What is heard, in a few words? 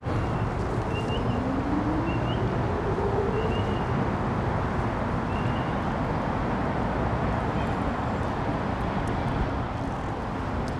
lake bbq; Coburg Lake; Coburg Lake 2026